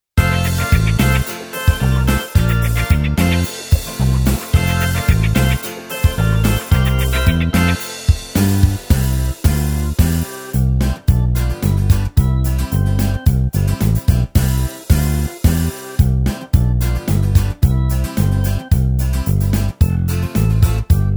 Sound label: Karaoke